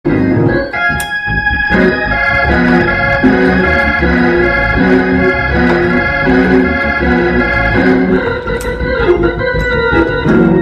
organ shout

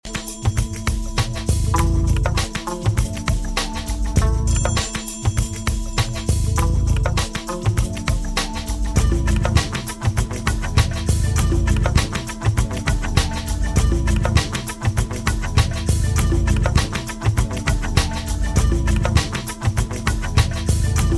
cubana dub, reggae

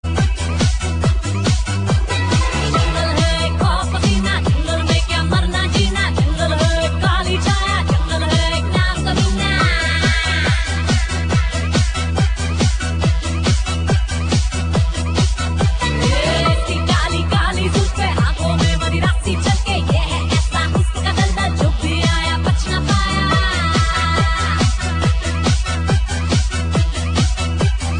Jungle wobble

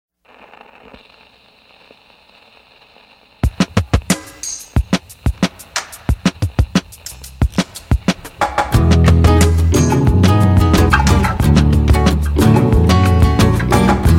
acoustic

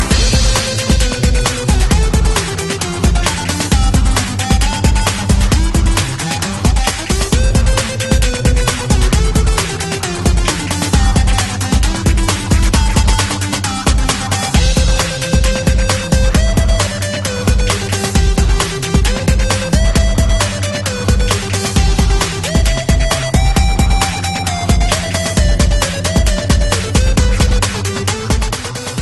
wedding march mix

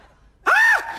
Suspense

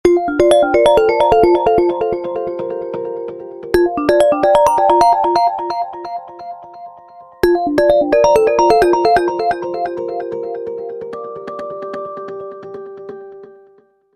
lumia 800 winning; ringtones

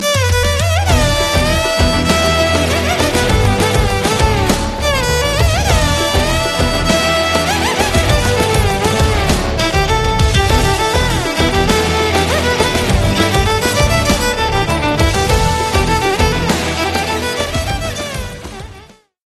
violin bit

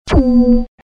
Electronic A tone

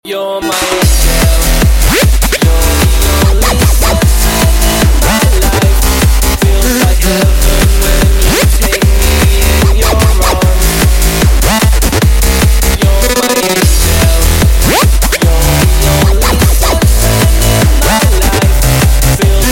Modulation

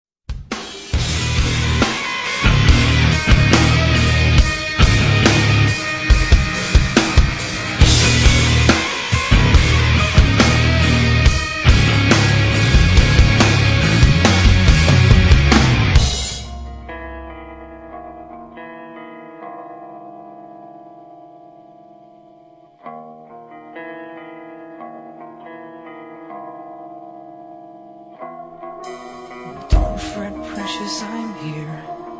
war drums

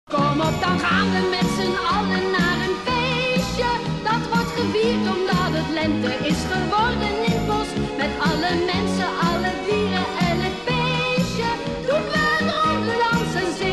endtune

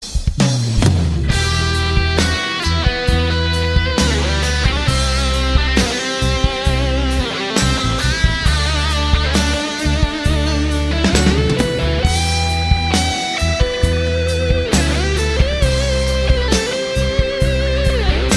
best rock

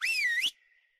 Whistle, Siffle

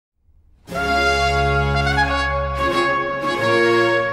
trumpets

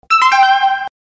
ringtone; gta iv

